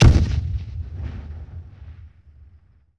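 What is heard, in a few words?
Gun Shot Low End Sweetener; Weapons & Firearms; Sony Pictures Sound Effects Series Volumes 1-10; Sony Creative Software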